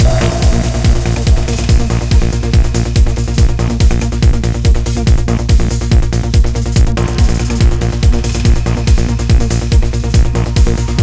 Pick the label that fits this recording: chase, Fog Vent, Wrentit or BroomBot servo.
chase